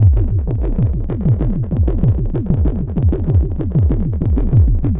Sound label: Synth Bass; Bass X